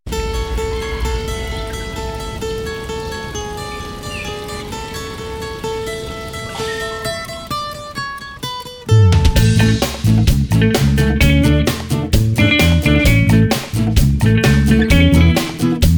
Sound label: The Jungle song Karaoke